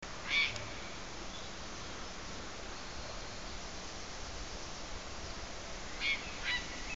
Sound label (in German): kraniche